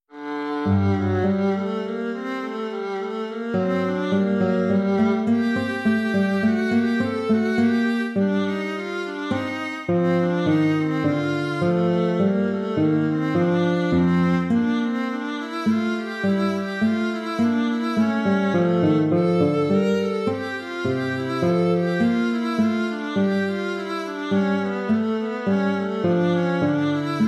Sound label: courante